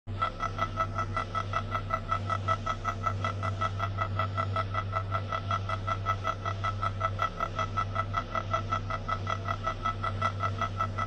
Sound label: Computer Mainframe; Fake Ship